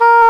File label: WND ENG HR, English Horn; Roland LCDP04 Orchestral Winds